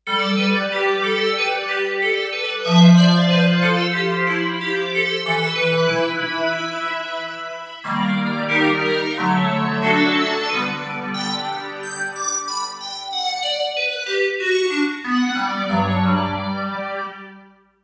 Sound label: Bell Echo, Kawai K5000S